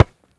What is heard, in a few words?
kickball